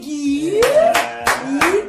iiiiir

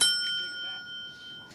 bell

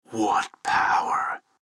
equip powerful loot; assassin

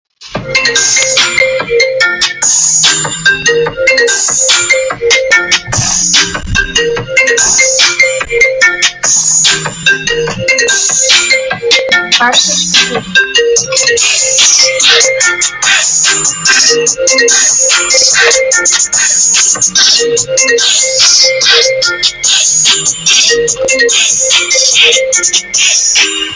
remix iphone; iphone 15

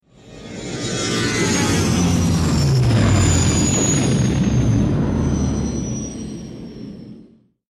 falcon fly by

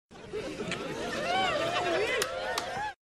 naughty oooh

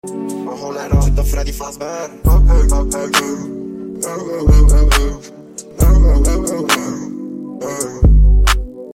phonk; freedy fazebear rizzy

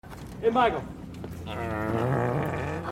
angry grandpa fart

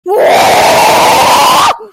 WUAH

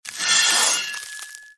sword skill; blue rose

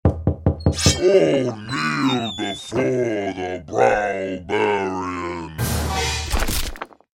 kneel

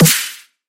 snare

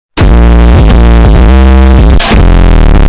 intro but its bass boosted; pornhub

